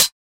short hi hat one shot B key, hat sound tuned to the B note; hat samples